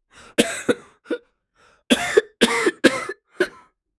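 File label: older man vomiting and coughing; disgusting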